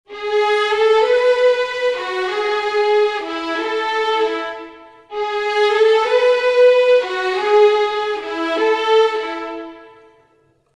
95 strings theme, Orchestral arrangements, 95 E maj